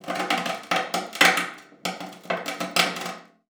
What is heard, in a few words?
CELLO FINGER; 90 s Sample CDs; Xsample VOL-5